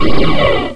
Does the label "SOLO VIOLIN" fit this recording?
No